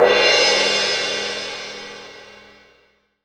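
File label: mallet cymbal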